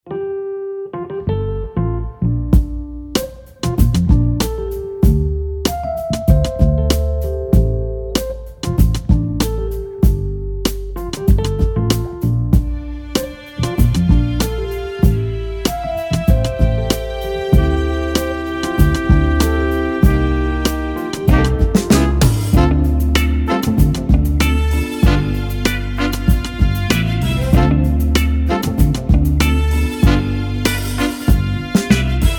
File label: soul